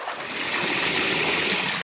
water fill

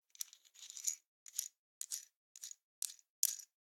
screw small; Tool

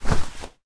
wall print

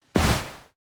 thatch

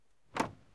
wooden small

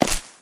grass wood Drop Into

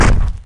brick Stairs

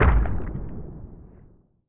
hunting fish hit big

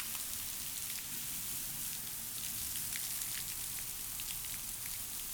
washing rinse; ship

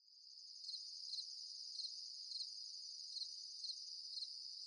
night enter

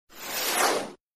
camera

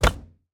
rooftile

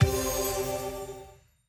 success

